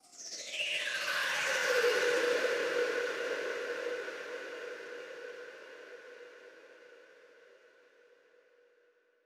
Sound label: SweepFx 138BPM; Sound FX; F major; Vengeance Samples; Another Day Kit